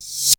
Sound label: REVERSE; JUST FOR FX